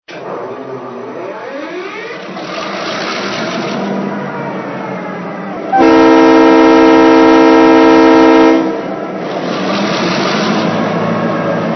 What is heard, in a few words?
MP15DC NS